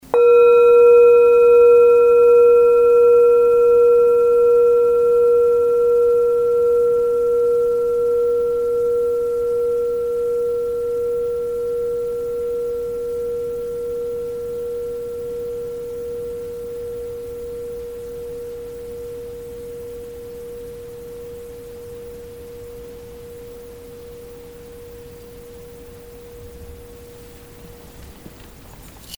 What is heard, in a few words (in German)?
klangschale; 300g; boen